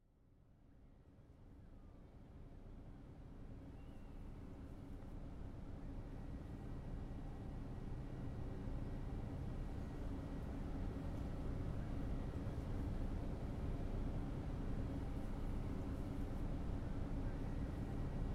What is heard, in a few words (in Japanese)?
マッサージ体験 ASMR; 『エステティシャン、マッサージ体験』ASMRver